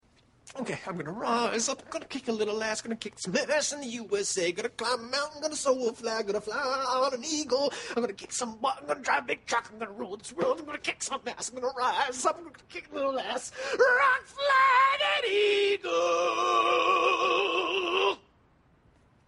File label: Rock Flag Eagle